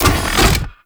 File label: Arachnoid Camper Missile Open; Warframe; Corpus